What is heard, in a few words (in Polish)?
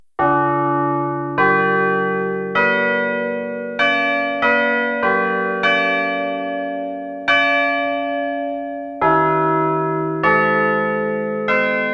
Apel dzwony